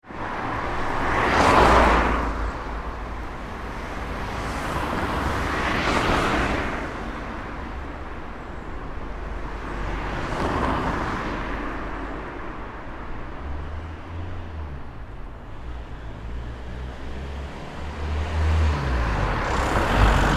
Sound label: ambience urban traffic main road med quiet dry, Traffic Urban Dry Main Road (40Mph); Traffic Ambience On Urban Main Road - Medium Quiet At Aprox 40 Mph; Traffic and Cars; Backgrounds